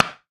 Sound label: Pickleball Paddle Hit Ball Hard